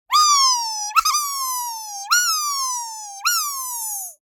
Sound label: cartoon character wee moving in stereo; sonniss gdc